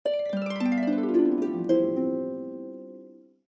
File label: harp sound